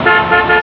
Cars Bus; Mosaic Magic

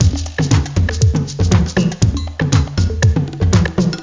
breakbeat; World of Sound; instruments; beats

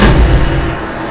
explo; qlat2; PC World 2002-12

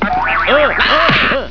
PAIN

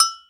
Agogo; Timidity

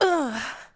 effort; urbanchaos

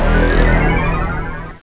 upgrade; acdemo; AC Demo 3-14-98 RipCord

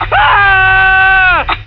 scream; Uprising

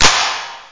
Claps Reverb; Amiga-CD 1996 #5-6; sampler; drums

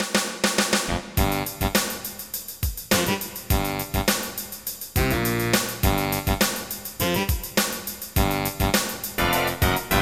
funk

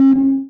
tab button

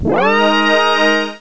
End of level (upswing); Prometheus 1.0

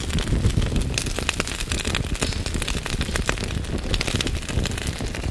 Burn Vegetation; 1944 Battle Of The Bulge

